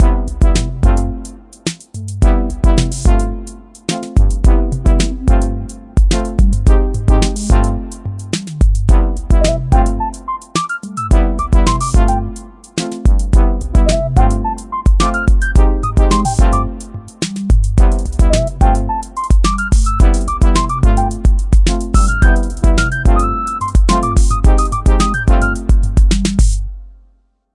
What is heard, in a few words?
Bgm Lobby, 108, 4 beats; Brix; Gacha